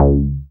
Bass SH-101

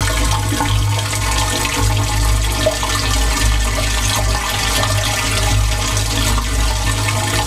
WET VENT PI, Sanitation Pipes; SFX

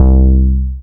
BAS MKS-50, Roland

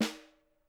SN, Percussion

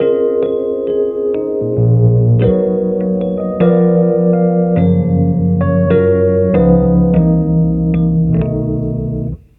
Keyboard; Sonic Foundry (Sony Creative Software); Infinite Soul VOL-2; Keys

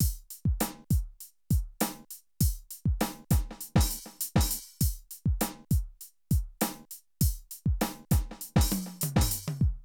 Drum loops, Streetsoul

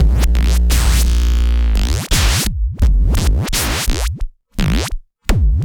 Structured Rhythm, IDM Rhythms

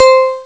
Warm Bells, Amiga Soundtracker; ST-XX Amiga Soundtracker Sample Packs

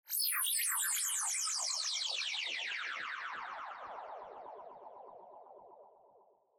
Synth FX

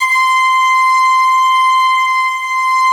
ST-ORG, STRINGORGAN, Yamaha VP-1; Music Store; 90's Sample CDs

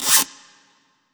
Scratch Wet; Effects

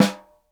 snare, Roland; LA Composer

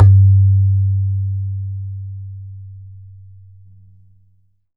BAYAN, Drums Percussion

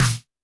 Snare, Roland TD-20

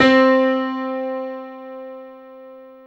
PIA MICRO, MICRO-PIANO

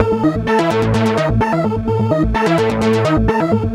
Arpeg, 128bpm, A, analogue circuit; musicradar

